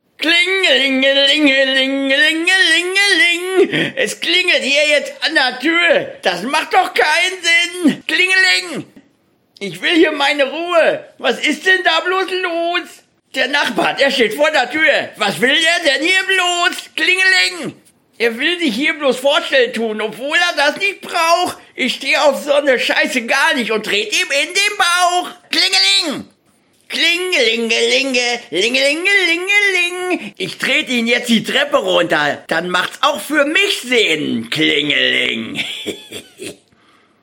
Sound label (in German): Klingeling